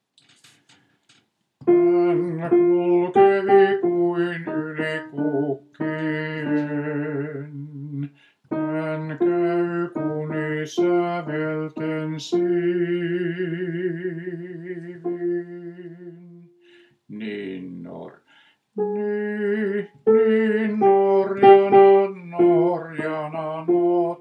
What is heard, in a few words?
Han kulkevi basso, stemmat miehet; 1. basso; Vetelin poikakuoro